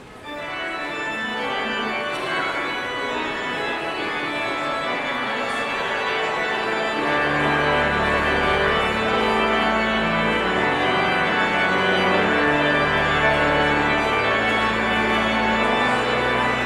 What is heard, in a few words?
Postlude